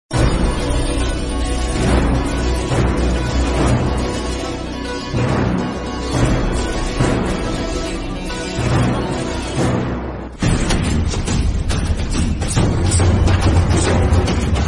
Background Music Ringtone; Ponniyin Selvan; Climax

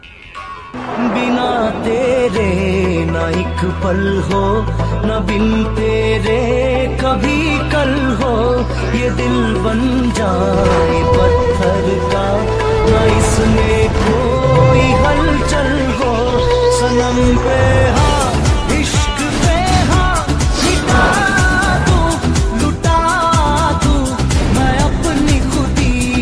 Heart Touching Song Ringtone

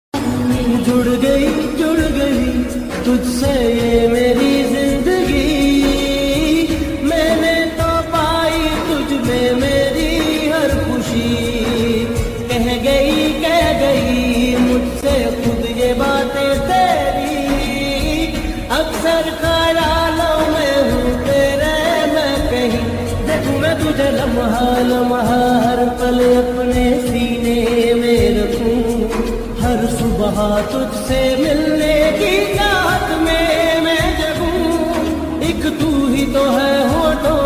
Romantic Song Ringtone

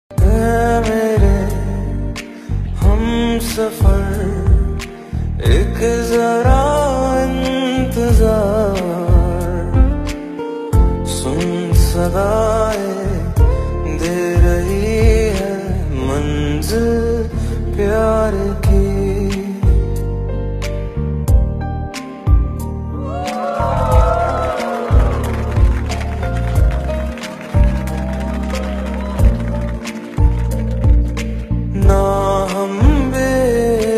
Old Hindi Song

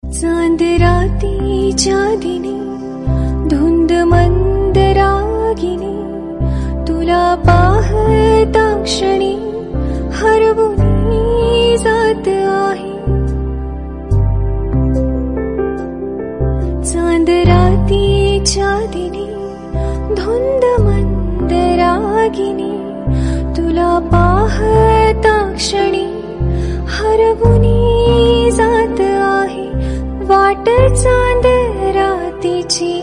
Ringtone Marathi Song

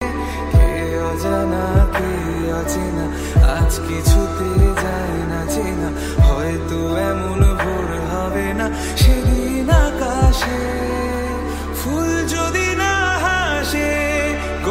New Romantic Bengali Song Ringtone

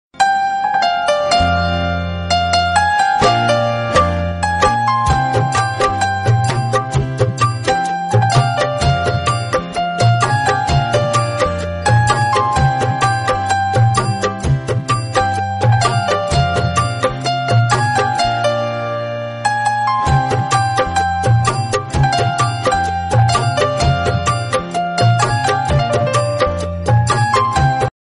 Beautiful Old Romantic Instrumental Ringtone; ringtones

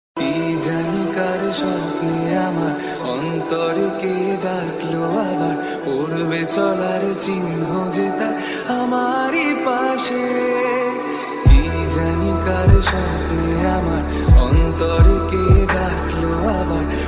Sad Bengali Song Ringtone

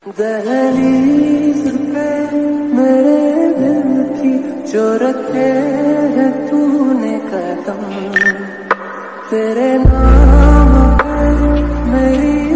Love Hindi Song Ringtone

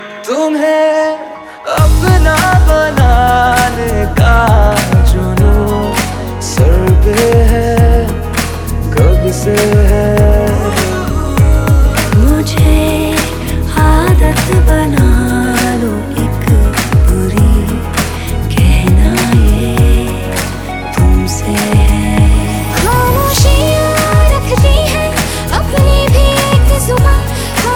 Hindi Romantic Ringtone

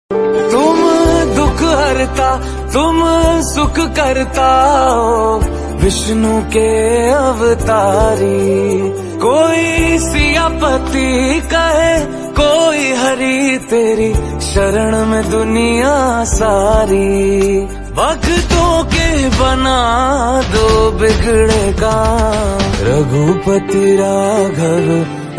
Devotional Ringtone

